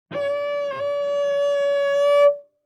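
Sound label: Cello arco fortissimo sul D Db4; MIS Pitches - 2014; Strings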